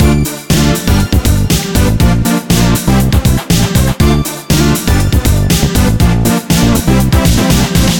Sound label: BGM sand speaker